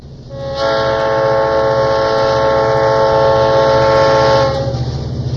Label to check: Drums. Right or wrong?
Wrong